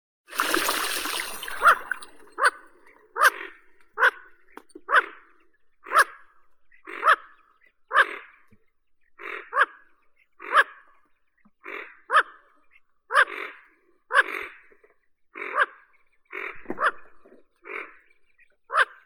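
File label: bufflehead, bucephala albeola; gogol malii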